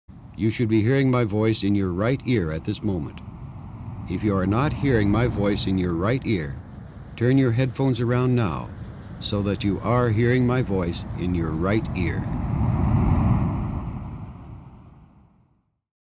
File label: Test Earphone; MBT Binaural